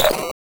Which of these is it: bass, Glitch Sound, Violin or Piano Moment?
Glitch Sound